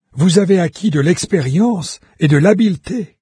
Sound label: levelup; Fate The Traitor Soul